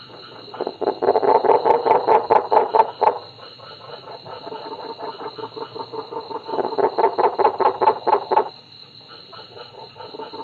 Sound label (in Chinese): 古氏赤蛙